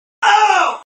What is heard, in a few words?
ow sound effect